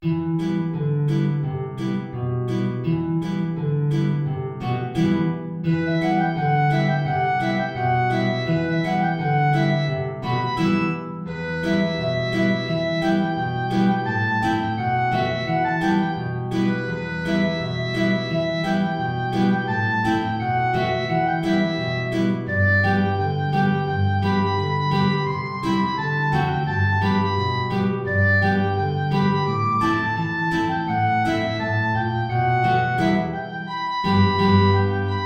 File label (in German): Klarinette in Bb Gitarre hoch; Chiribim Chiribom